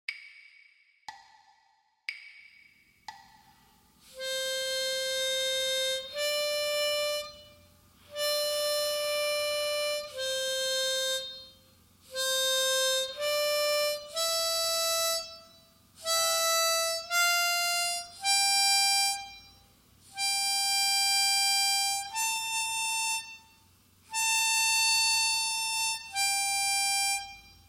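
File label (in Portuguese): gaita, exercícios de leitura à primeira vista; Gaita de Boca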